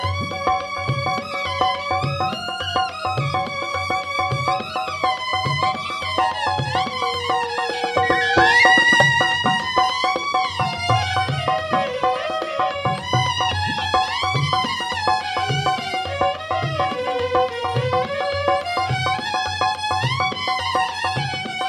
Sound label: Instrumental